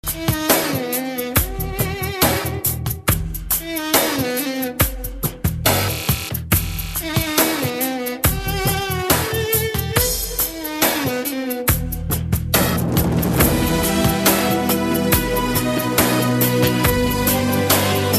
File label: Sad Music